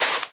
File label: fs sand, sound characters; Spearhead Demo